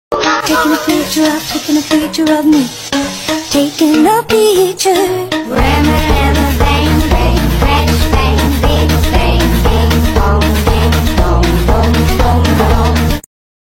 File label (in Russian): zvonok; zvonok v akademii bafometa